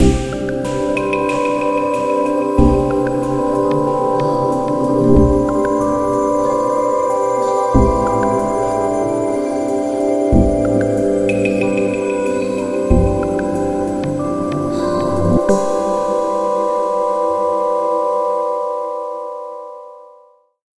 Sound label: Velvety Resonance; Sony; Xperia Movie Creator Music